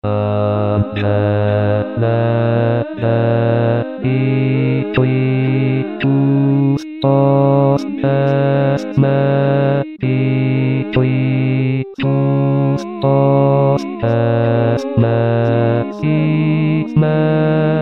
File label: oboe